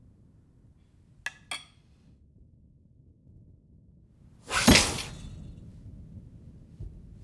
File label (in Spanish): golpe en mesa, sala